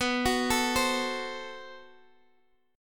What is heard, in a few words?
A-Minor 6th B, chord; Am6/B; arpeggiated